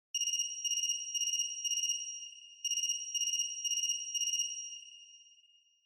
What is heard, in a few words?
Feature Phone Ringtone Reverb High